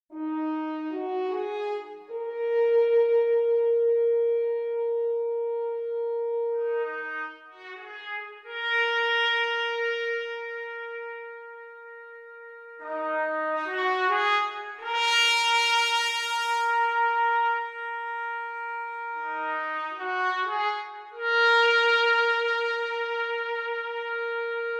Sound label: Brass Vibrato; Spitfire